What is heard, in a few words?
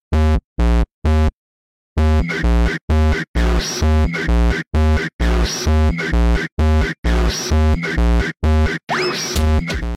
funk